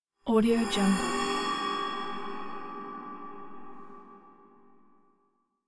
metallic scary stinger

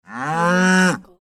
cow

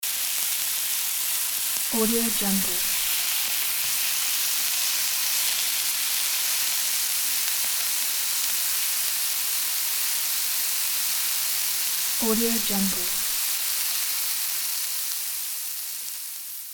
cooking frying pan

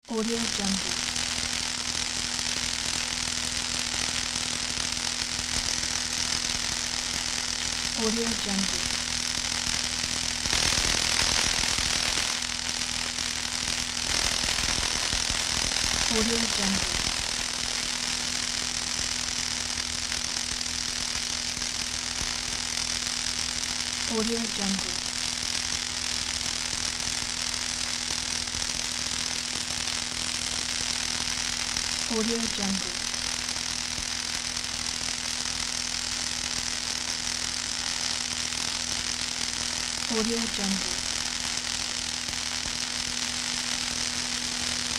electricity

